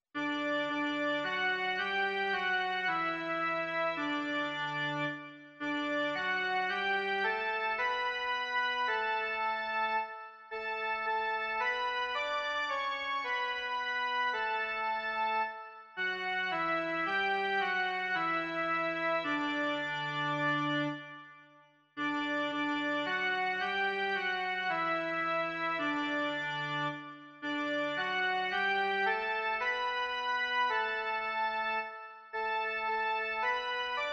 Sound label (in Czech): jen melodie; Tebe, Boha, vzýváme